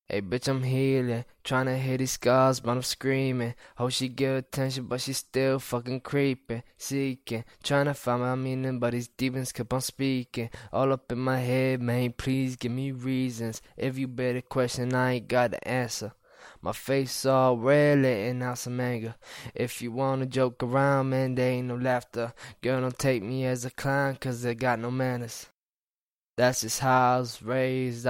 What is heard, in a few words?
BPM155 Key Gm, Acapellas